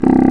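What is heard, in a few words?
contra bass; Proteus2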